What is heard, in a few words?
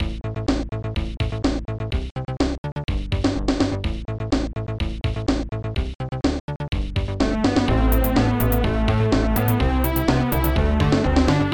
egyptian; F1 Licenseware - Volume 1